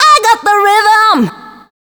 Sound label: Dance Vocals, FEMALE